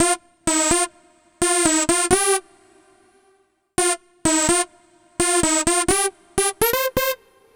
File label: Android Riff, Funk; Dr. Fink's Funk Factory